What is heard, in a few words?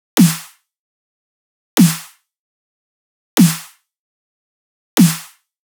Dubstep Drum Loop Snare, 150bpm; Stem Seperated